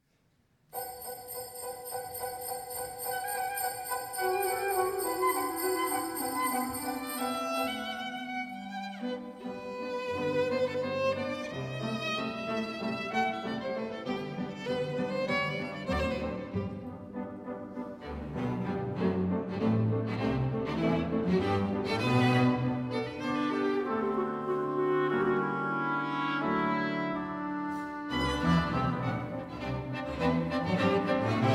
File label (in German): mahler symphonie nr 4 g-dur satz 1